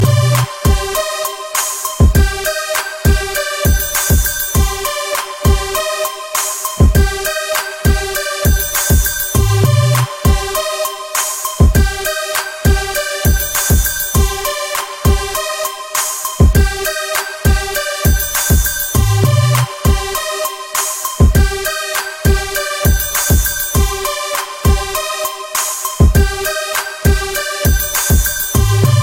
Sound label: Yeah, Ringtones